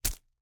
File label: slingshot, dry firing, slap, rubber, rustle, shot